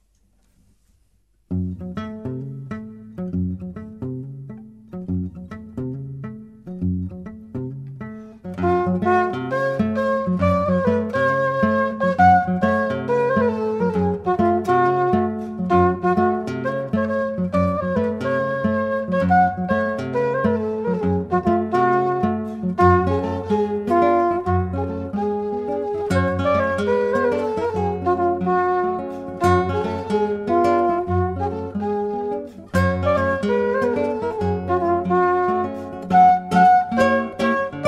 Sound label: Swing folk